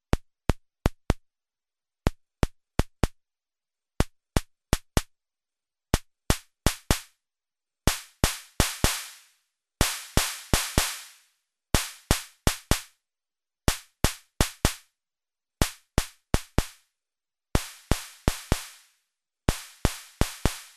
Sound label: MODOR DR2 ANALOG SNARE